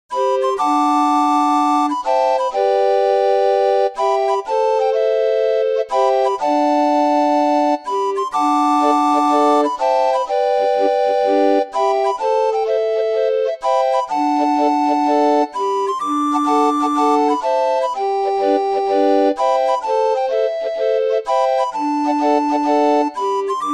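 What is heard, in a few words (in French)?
orgue de barbarie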